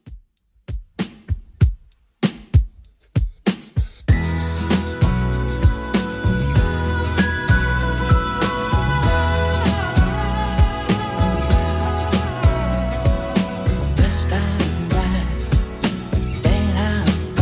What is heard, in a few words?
70s Redrum 97 bpm CLEAN